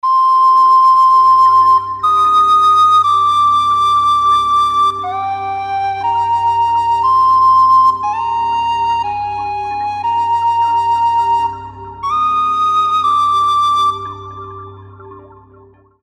Whistle D

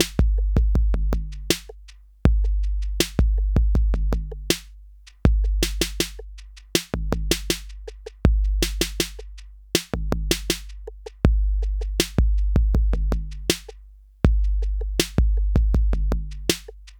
Chandler Limited Zener Bender Hardware 808B; Softube

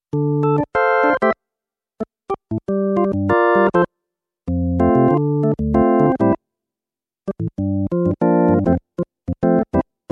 Nord Stage 2 B3

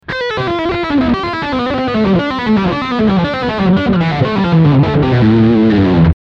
Legato rear humbucker

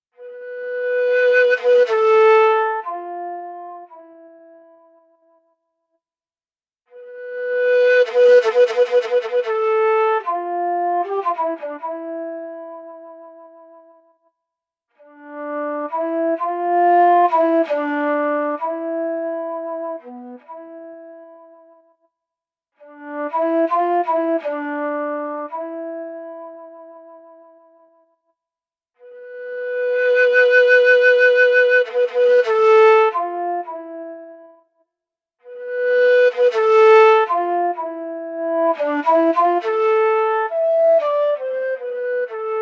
YDS-120 Shakuhachi

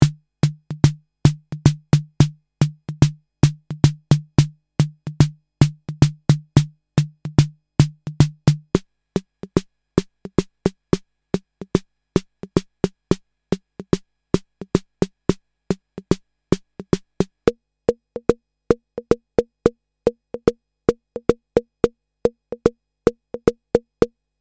Snare

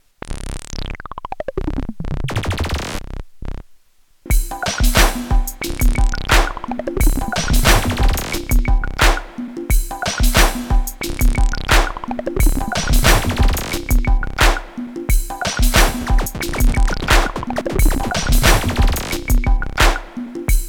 kaossilator 2 Downtempo

